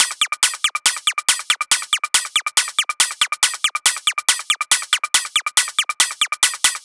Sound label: 140 top